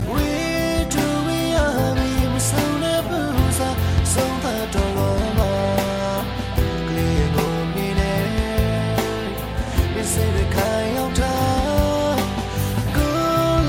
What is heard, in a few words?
myanmar guitar; hip hop